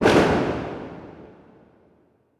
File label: explosion loud internal reverberant; sound effects one